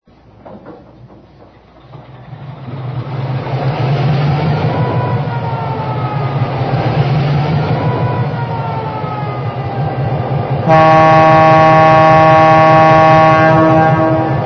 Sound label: EMD E-8; BM